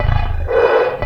MACHINES

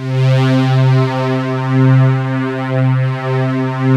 PADS; Pro-Rec - Synthsonic; Z1 PADS

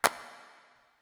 Clap, Drum Percussion Single Hits; Zero-G; Nu Disco

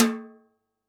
TIMB, Yamaha DD-65, PERC

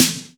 SYN POP SN, TECHNO; Drums In Your FACE!

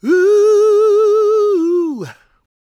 GOSP MALE, SOLO; Spectrasonics; Vocal Planet

